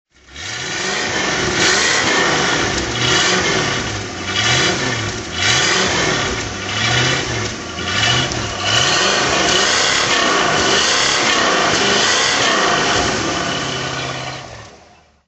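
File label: ford thunderbird throttle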